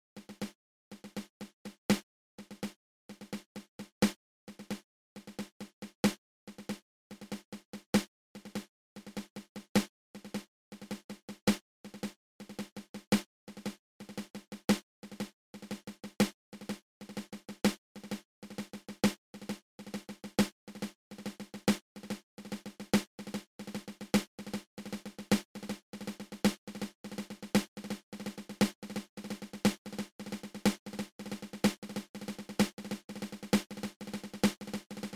Double Ratamacue open close open

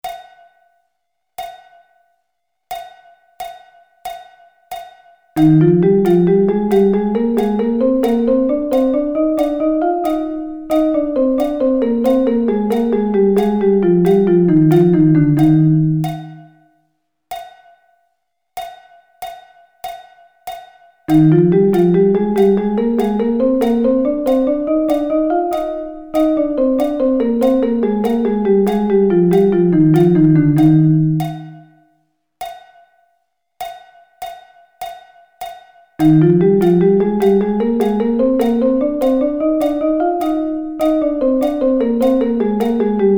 Eb Double Sticking

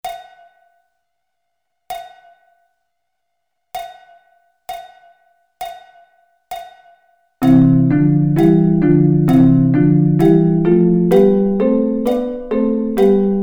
C Double Stops

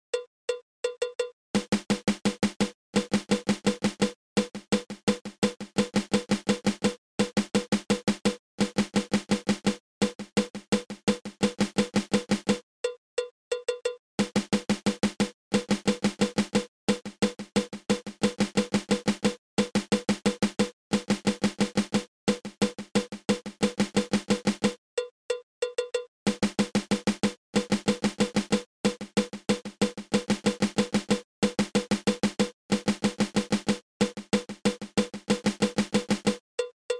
Flam

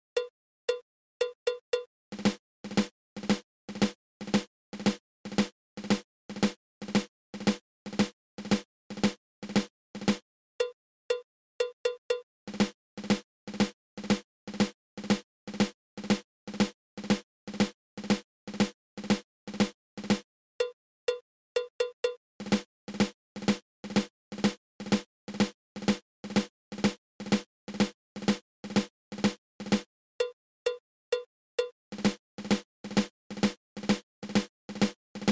Drag

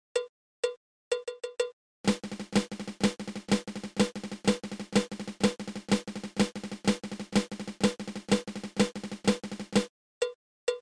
Flam Drag

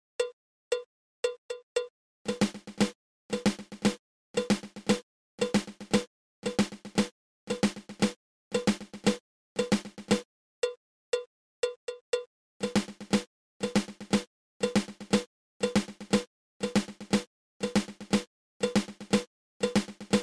Flamacue

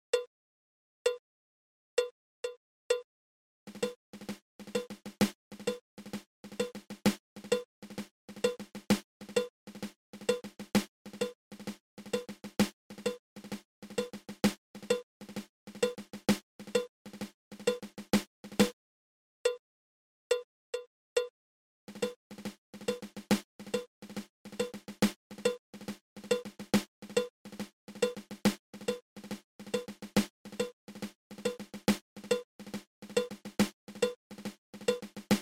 Triple Ratamacue

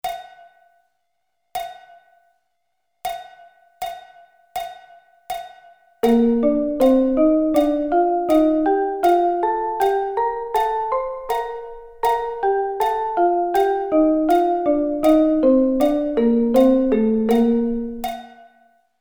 Bb Thirds

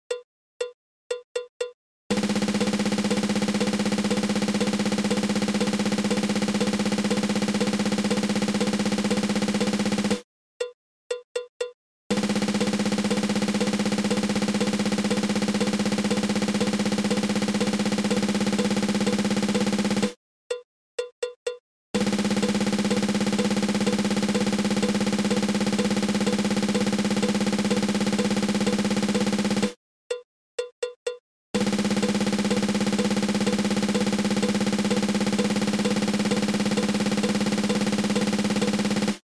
Double Stroke Roll

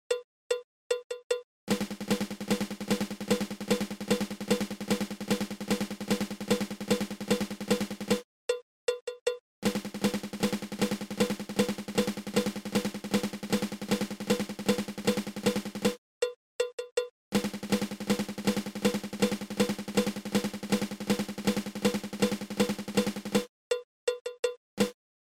Flammed Mill

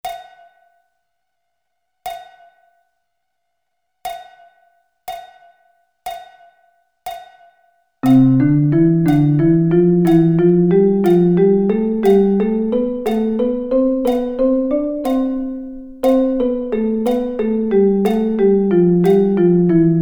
C Double Sticking

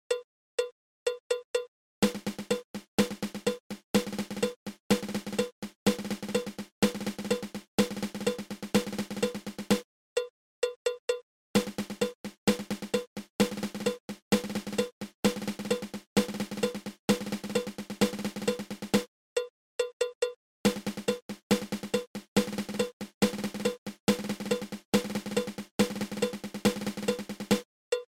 Drag Paradiddle 2

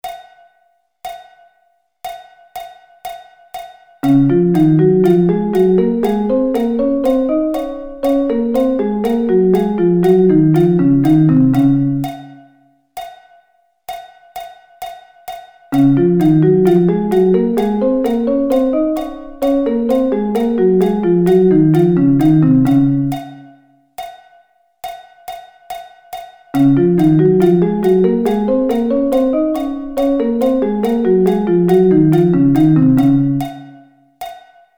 Db Thirds

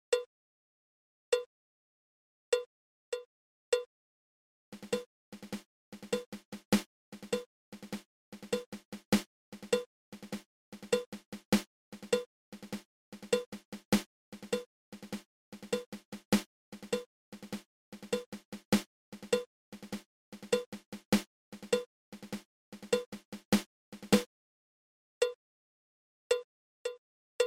Triple Ratamacue

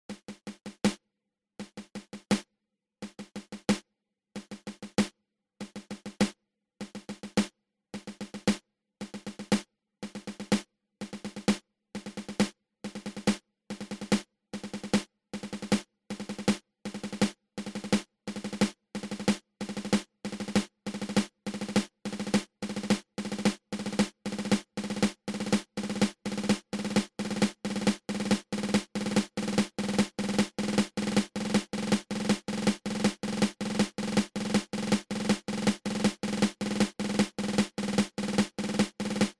Five Stroke Roll open close open

